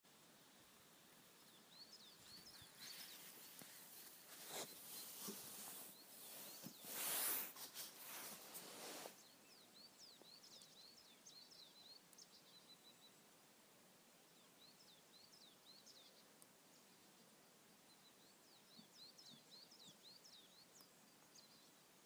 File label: Jurtendorf nature life stream